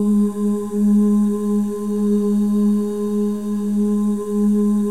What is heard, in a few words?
M+F OO G#3; Spectrasonics; Symphony of Voices; M+F OOS